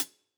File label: close Cl Hat, drum